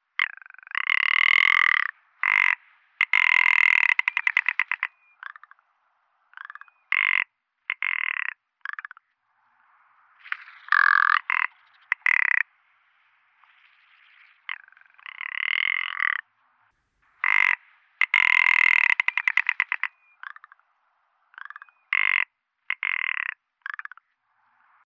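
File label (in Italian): Pernice Bianca Lagopus mutus; Versi Animali; Il Forum della Fotografia Naturalistica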